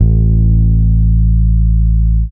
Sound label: bass, Roots Funk; USB Soundscan vol.41; AKAI